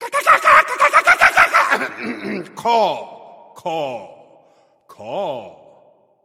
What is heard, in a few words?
laugh